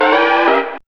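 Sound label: synth tone; E-Lab; X Static Goldmine 1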